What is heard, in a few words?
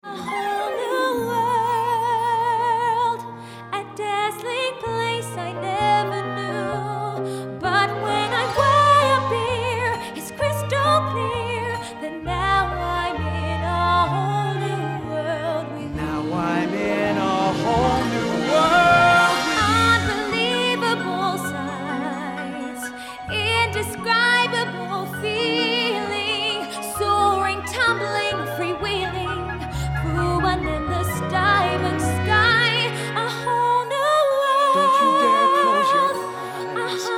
Singing